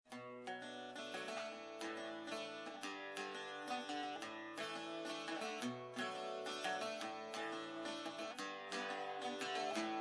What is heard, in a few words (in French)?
accompagnement; old dundeen